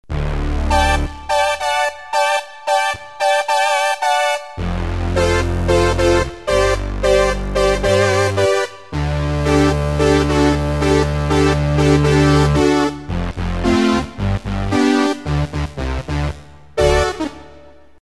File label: Yamaha TG55 Brass; Factory Presets